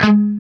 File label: CHUG G#2 L, Guitar; East West; Steve Stevens Guitar Samples Collection